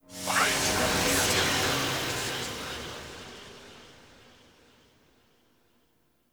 Puzzle Mural Hint; Puzzles; Circade

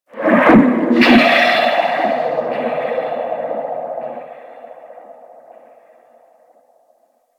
Ocean Poison Plant; Ambience; Warframe; Grineer Ocean

